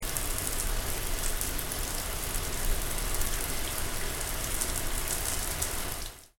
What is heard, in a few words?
bathroom shower